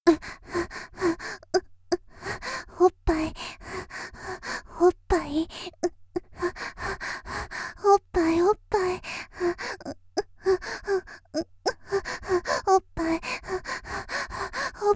「パイズリ声」（おっぱい）と言いながら激しい喘ぎ, 綾波系少女

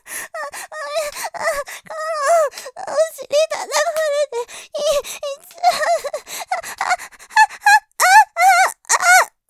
絶頂スパート, ASMR; お尻、叩かれてイッちゃう；；; ループで楽しむ！シェフの気まぐれ抜き抜きコース; モノラル

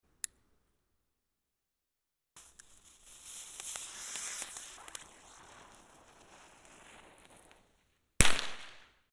ねずみ花火; 夏実と縁側花火デート

